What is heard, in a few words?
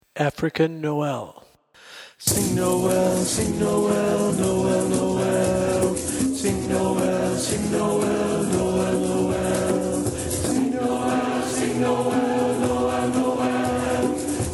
African Noel Hi Tenor Dub, Learning Tracks